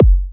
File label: Kick Edm Kick